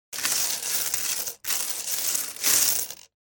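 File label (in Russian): роются в шкатулке с мелочью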